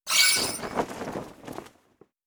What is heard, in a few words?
monster